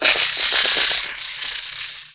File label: attack